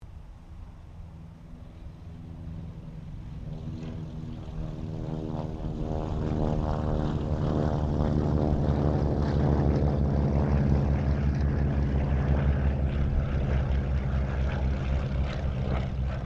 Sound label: AIRCRAFT C195 SQUADRON 2 TAKEOFF, Squadron of 2 Cessna C195s; Airplanes; Vehicles